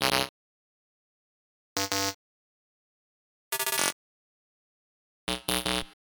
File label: Error